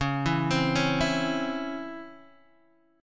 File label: C sharp Minor Major 7th C sharp Guitar DADGAD